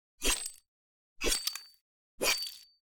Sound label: Weapon Quick Slash High Ring Tail